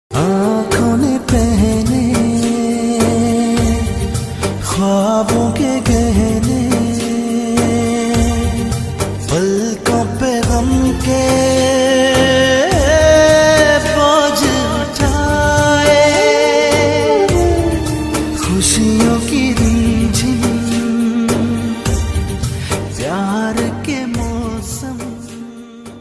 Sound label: emotional pakistani